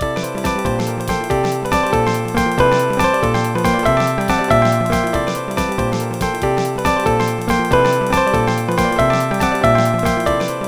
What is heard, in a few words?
solo; Dr. T's MIDI Recording Studio